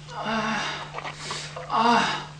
「ァアッー… ああ…」

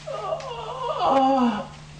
「ォォォォォォォオオゥ…」

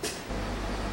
糞「プッ（つばみたいな音）」

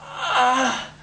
「ァァ！」

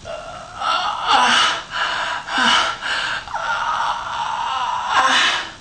アァァァァァァ、アッー… ハァハァ、アッーーーーーー… アアッ…

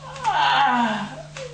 「ンァーッ…」

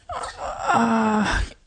「アッアッアッー…」

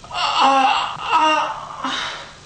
「アァ、アァ、アッ…」

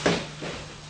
何かを置く音

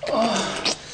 「オォウ…」

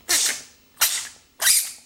チュパ

